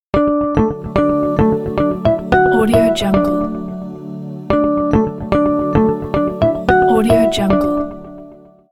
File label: ringtone new age